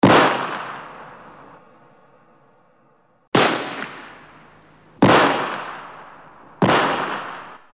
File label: handgun multiple shots